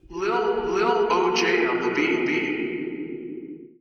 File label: music producer tag